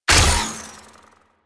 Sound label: Full Draw Shot, Weapons; Warframe; Infested; Derelict Cernos Prime